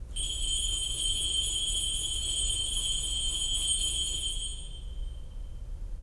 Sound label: sleighbells